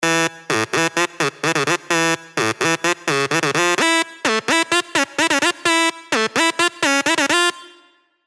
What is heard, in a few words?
Raggen, Sylenth1 dirty dutch leads; revealed sylenth1 dirty dutch leads vol 1